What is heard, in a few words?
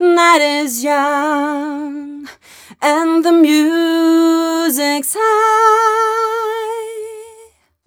f mel d, fem Vocals